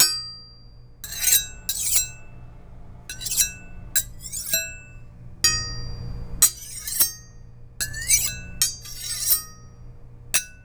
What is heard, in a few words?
Knife, kitchen